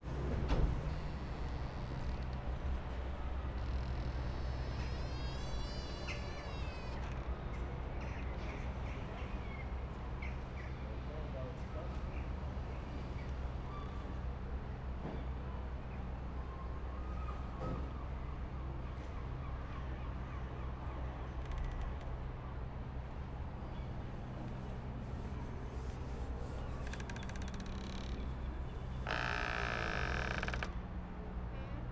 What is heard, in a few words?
Door; A door creaking in the wind Schoeps CCM M/S and Tascam Dr60M; Binckhorst